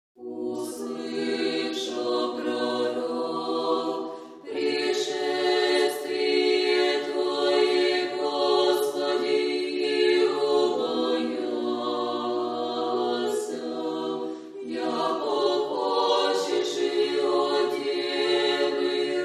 uslysha prorok prishestvie tvoe irmos 4-oy pesni velikogo pokayannogo kanona